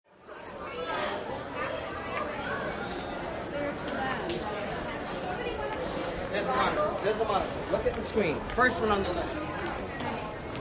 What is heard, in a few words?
Airline terminal crowd & p.a.